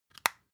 floss close, Bathroom; haus-garten; badezimmer

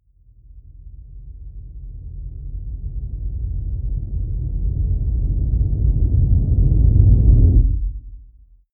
deep noise rumble, risers

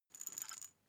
glass pepper tilt short; soundpool

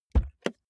car bmw e46 interior door front open; Cars

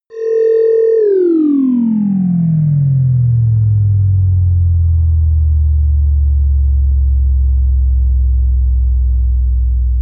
protective shield shut down; energie

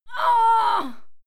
female fight moan pain heavy; schreie; menschen

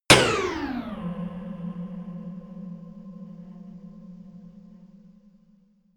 racing car hit; hits explosionen; impacts

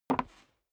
step boots wood creak single right; Footsteps; schritte

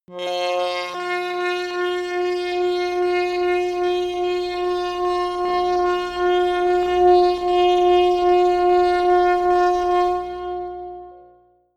synth bass smooth long; music elements

